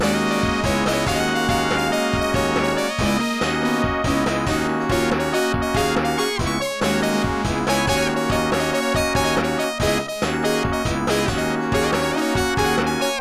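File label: background music; Quiz & Dragons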